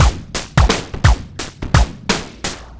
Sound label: argh break, breakcore